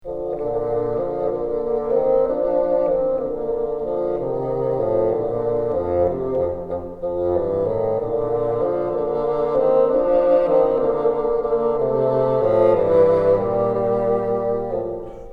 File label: together, bassoon